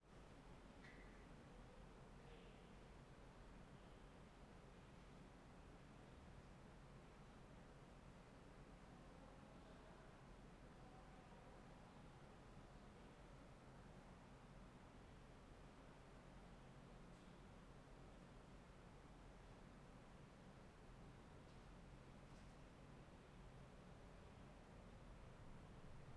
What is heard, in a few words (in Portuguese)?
Ambiencia Garagem Subsolo com Pessoas ao Fundo; ambiencia domestica